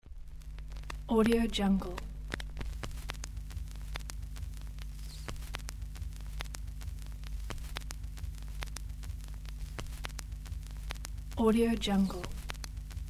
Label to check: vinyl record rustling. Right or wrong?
Right